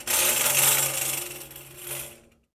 Marches Tamburin; Percussion

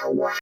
Amb Hit, Drum Machines, Effect; Yamaha DTXpress

